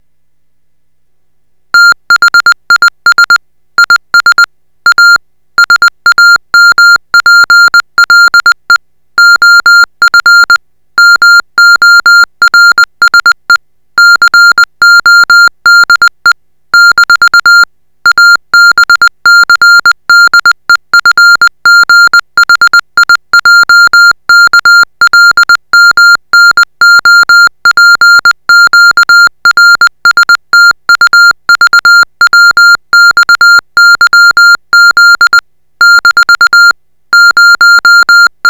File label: Morse 20wpm, shortwave